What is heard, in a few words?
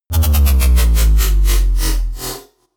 dubstep metal wobble bass drop